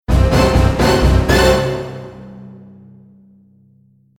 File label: surprise